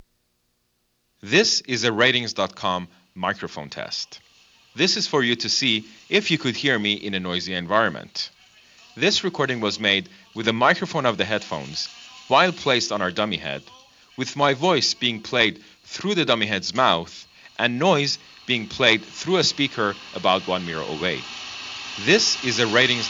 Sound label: subway noise gate